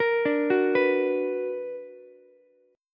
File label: B Minor Major 7th, Ukulele; arpeggiated; JGuitar